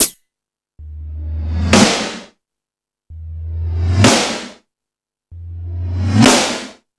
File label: Hip House, Drum Machines E-MU Pro-Cussion